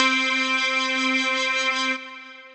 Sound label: Halloween Bells, Synth Lead; Ableton; Factory Packs; Chop and Swing